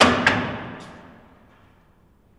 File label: Jail Door Open Rattl; jail & prison doors